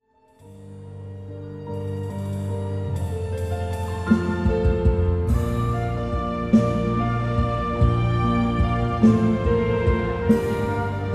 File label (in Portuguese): Piano e Cordas